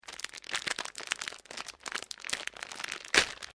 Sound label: PLASTIC WRAPPER OPEN; Plastic Sound Effects; Plastic (Sound Effects:Materials)